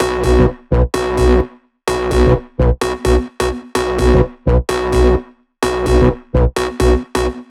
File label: 128BPM Melody; Vengeance Samples; Neo Pop Kit